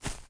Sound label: thug fs grass, footsteps